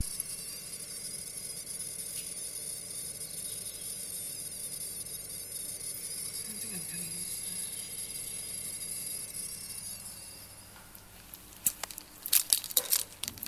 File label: security barrier singing to itself